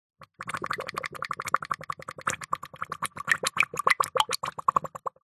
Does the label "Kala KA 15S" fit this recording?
No